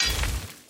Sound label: refining click